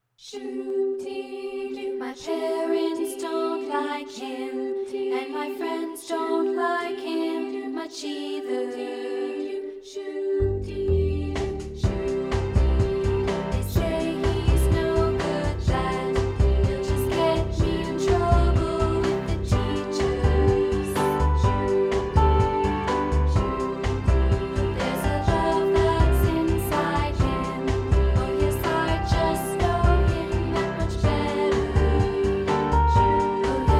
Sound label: vocal test